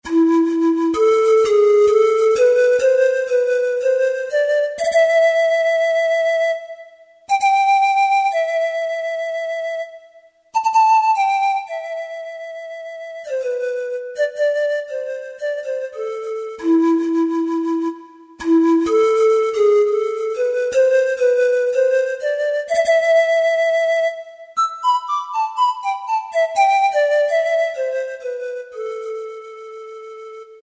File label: Sweet Pan; Yamaha YPT-400; Voices